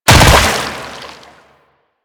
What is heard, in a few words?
Land Water; Warframe; Infested; Ancient; Footsteps